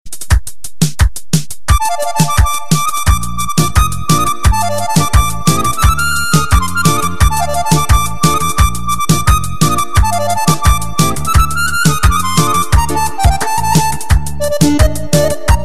Instrumentala

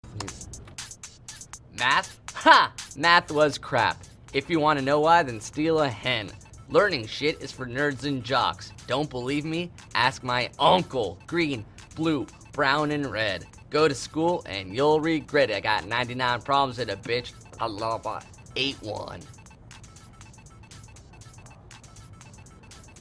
Is Calling Rap, ringtones

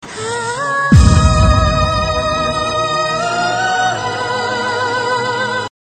humming music; ringtones

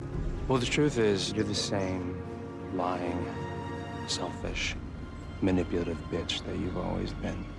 Ska

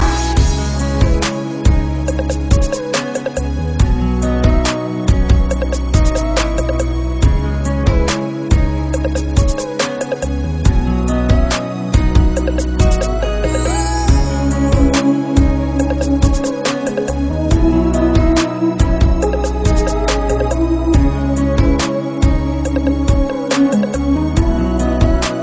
nice tone beat; dance

